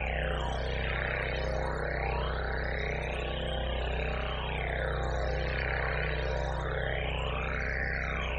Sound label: amb portal